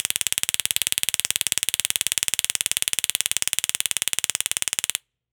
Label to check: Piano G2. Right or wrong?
Wrong